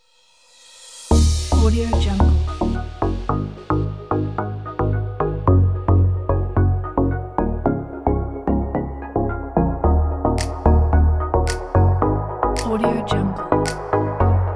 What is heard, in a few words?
beach dance; tematix